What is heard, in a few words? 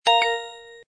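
Camcorder Stop; LG GT350i Wink Plus; System sounds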